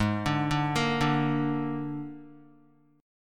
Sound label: G sharp Suspended 2nd Flat 5th G sharp down Guitar, chord; G#sus2b5; arpeggiated; JGuitar